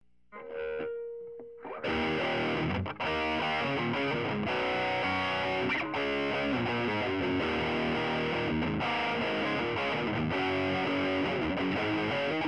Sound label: subway rocket tone contour mode, mesaboogie